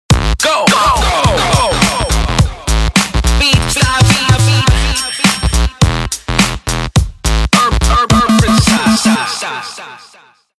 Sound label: Style Beats, ringtones